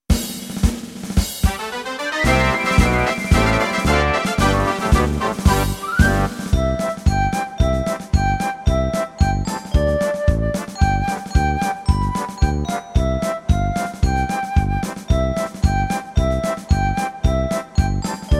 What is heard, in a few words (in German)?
Begrüßungslied Playback